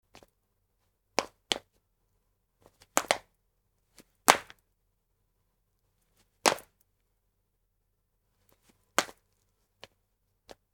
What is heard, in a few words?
step; 固い床でジャンプと着地（金属？チャリッというノイズ入り）